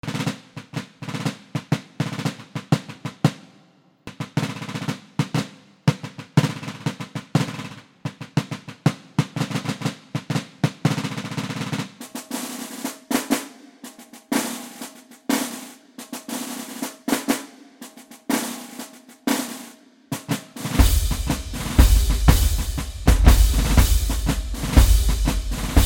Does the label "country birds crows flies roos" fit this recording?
No